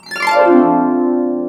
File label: HARP; AKAI S-Series CD-ROM Sound Library VOL-2; CHO HARP